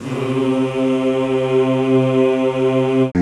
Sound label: CHO C2; Sound & Vision; Gigapack I CD 2; CHO Zzzz + Vvvv; CHO Vvvvv